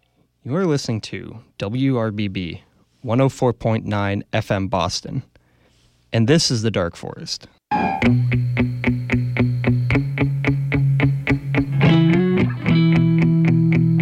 ramble rock

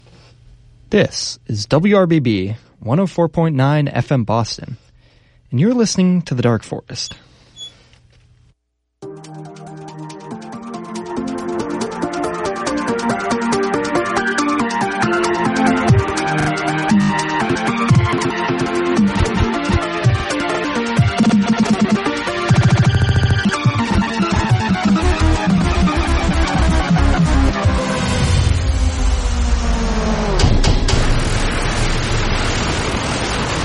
chiptune

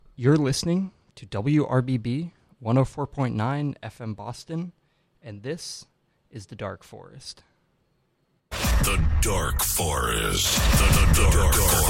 jazz

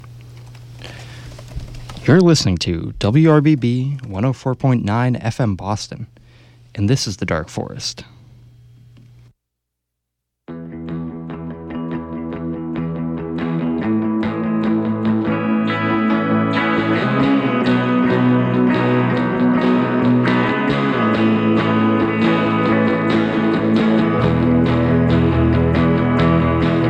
indie rock

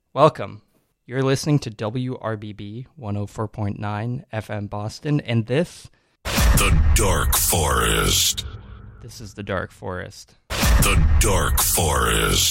chillwave

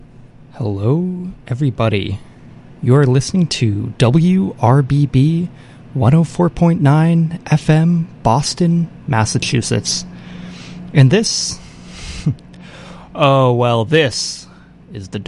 crescendocore

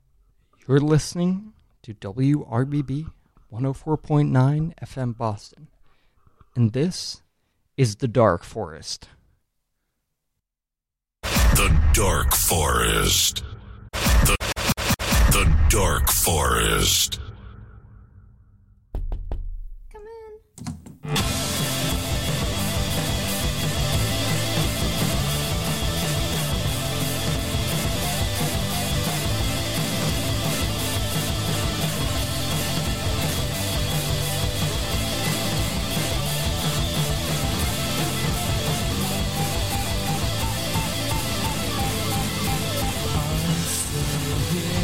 5th wave emo

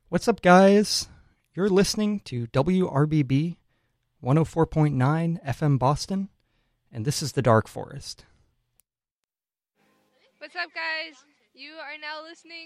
indie rock

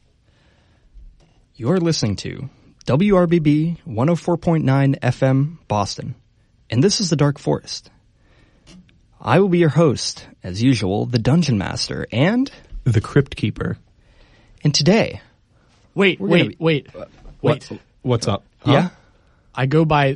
experimental hip hop

